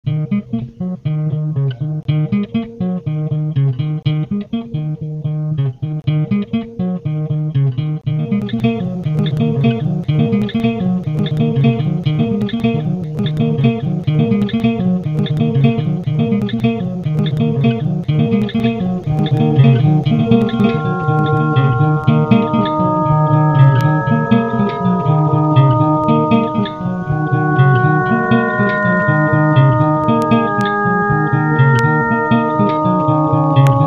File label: guitar